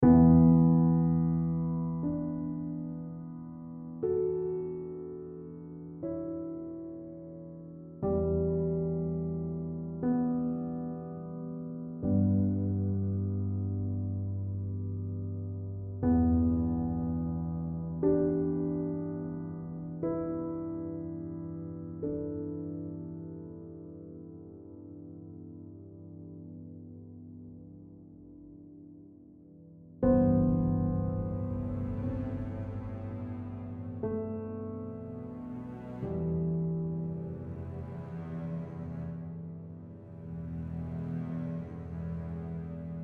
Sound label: Orchestral D E